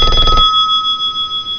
Bell Telephone

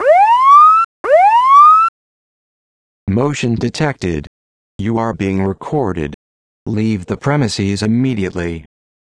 Motion Detected with Siren

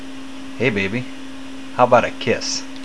Kiss

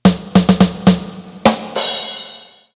Shave and a Haircut

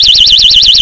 High pitch fast siren

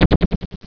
red small explosion

